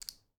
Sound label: Chip Throw; This Is the Police